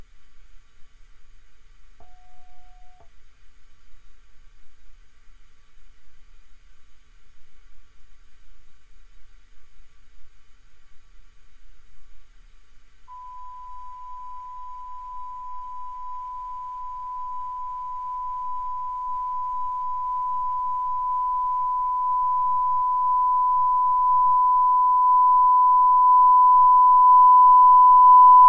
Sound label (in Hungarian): Alesis alapzaj